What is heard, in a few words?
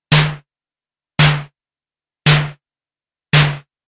Snare Deckard VCO Sys 500 Filter